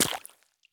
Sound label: run puddle; Escape from Tarkov